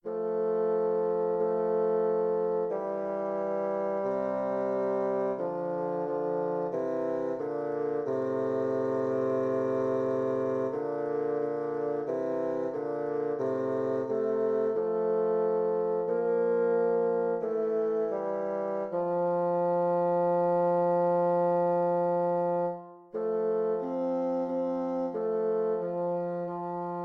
beginning bassoon duets F major

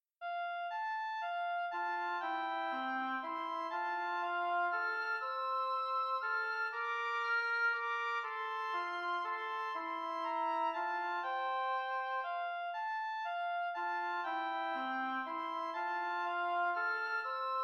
high notes

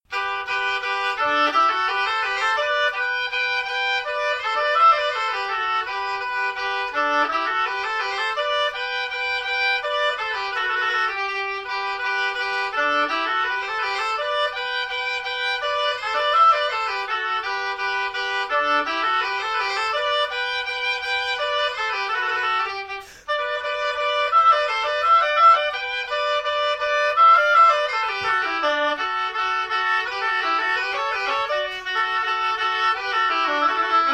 rondeau oboe duet; chedeville

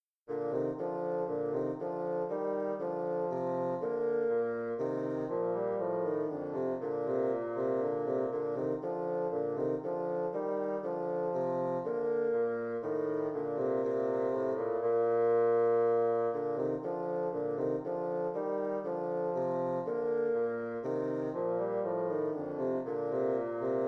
bourree 2 bsn

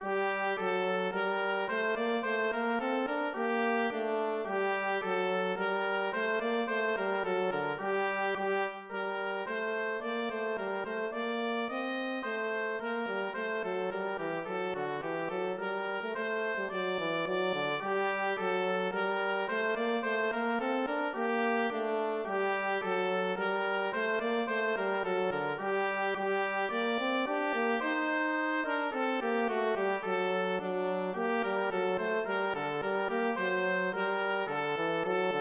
tambourin tpthn duet

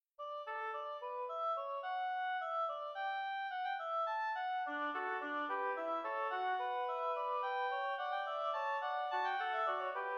oboe fugue in D major; philidor

